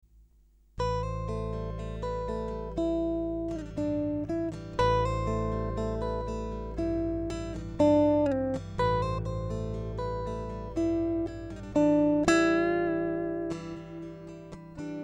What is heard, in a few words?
AGEGAE 120 GTR arpeggios